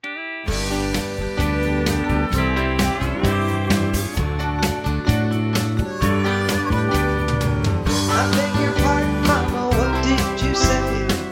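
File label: Instrumental with background vocals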